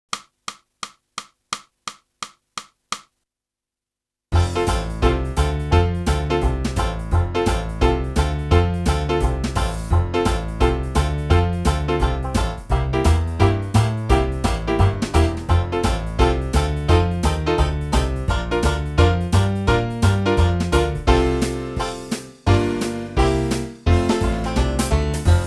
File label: sax